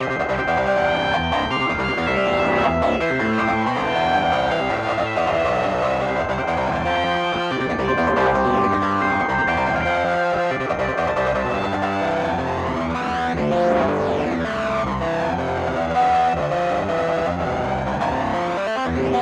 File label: synth heavy